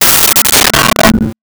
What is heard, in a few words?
Metal Hit